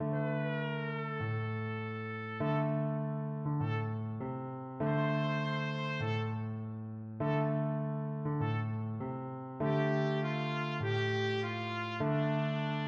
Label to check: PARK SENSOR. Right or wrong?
Wrong